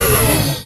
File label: player exit speed paint